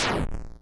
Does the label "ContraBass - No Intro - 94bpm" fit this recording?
No